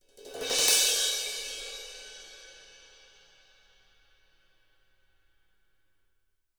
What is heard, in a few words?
CYMBALS; Sampleheads; Peter Erskine Living Drums; SD CYMBALS